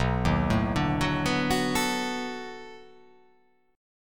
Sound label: B 7th Sharp 9th, down